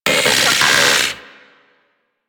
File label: Vocal Pain; Warframe; Corpus; Arachnoid Wraith